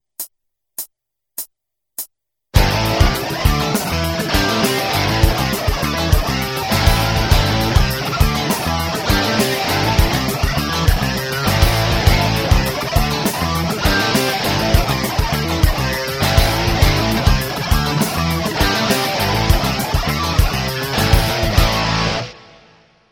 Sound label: penta, guitar